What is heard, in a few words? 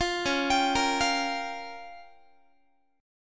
Db 6th Flat 5th Db down Guitar; Db6b5; arpeggiated